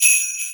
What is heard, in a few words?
Sleigh Bells Single; Warframe